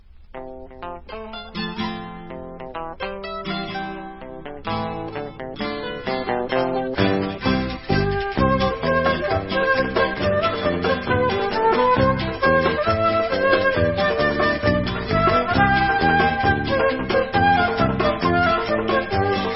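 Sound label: Instrumental, Israeli Dances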